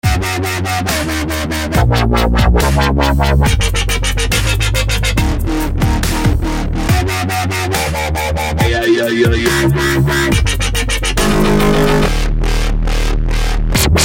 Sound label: dubstep; REASON PRESETS